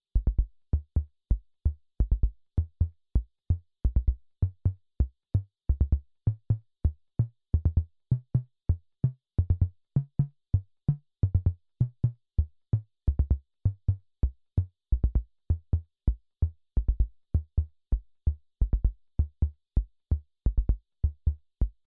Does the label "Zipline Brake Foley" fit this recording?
No